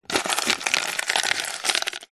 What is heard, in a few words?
coffee pods in plastic container movements handling; food drink coffee nespresso